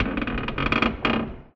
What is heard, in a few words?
metal low creak squeak